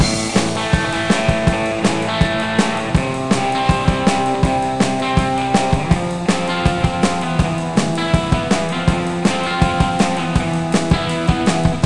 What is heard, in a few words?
Music Cave